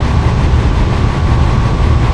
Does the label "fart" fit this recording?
No